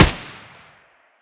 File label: scar muzzle mono distant